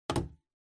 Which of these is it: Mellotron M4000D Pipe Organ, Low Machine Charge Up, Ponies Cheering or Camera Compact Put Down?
Camera Compact Put Down